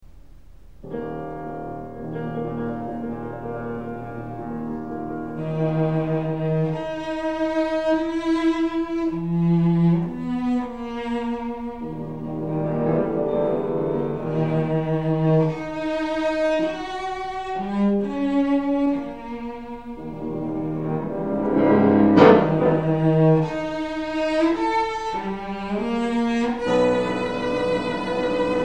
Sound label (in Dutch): Start cellosonate